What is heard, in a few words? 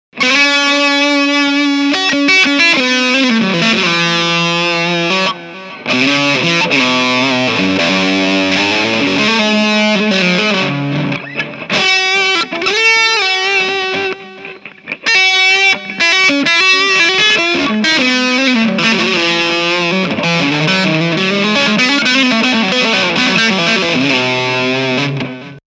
full gain steg lead; myPaula